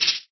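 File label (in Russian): шейкер глухой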